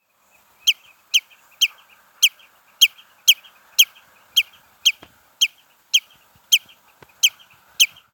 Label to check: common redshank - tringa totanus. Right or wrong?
Right